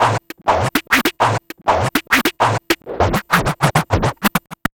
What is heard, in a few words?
Vinyl Scratch Snare